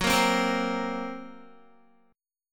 F sharp Major Flat 5th F sharp down Guitar; F#Mb5 strummed

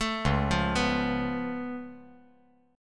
Db Augmented 7th Db down; arpeggiated; JGuitar

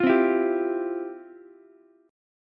F Major 7th Suspended 2nd, Ukulele; strummed; FM7sus2; JGuitar

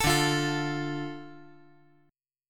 D Minor D, chord

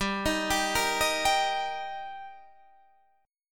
G Minor Sharp 5th G, Guitar, Standard; arpeggiated; capo 2; 15,18,17,15,16,15

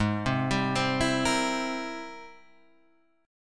G sharp Augmented Major 9th G sharp, down; arpeggiated; JGuitar

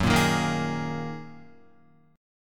Gb 6th Add 9th Gb down Guitar; strummed; Gb6add9; JGuitar

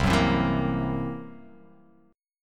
C 7th Flat 5th, C, down

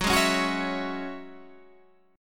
F sharp 7th Suspended 2nd, Guitar, Half Step Down

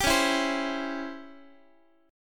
Db Minor Major 7th Flat 5th Db down Guitar; DbmM7b5 strummed; JGuitar

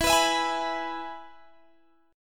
E Major guitar chord, Half Step Down

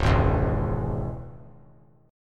Db Minor Db; strummed; JGuitar

